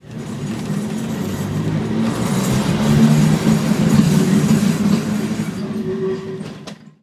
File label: tram; tom tait; simutrans; Pak128.Britain-Ex